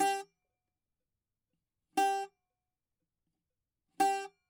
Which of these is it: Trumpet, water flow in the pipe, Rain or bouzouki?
bouzouki